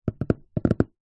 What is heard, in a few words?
plastic tub empty tap base hand drum rhythm; foley